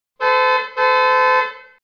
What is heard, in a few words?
car horn No Window